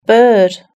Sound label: bird